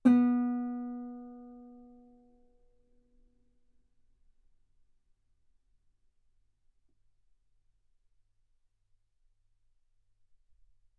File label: Guitar B3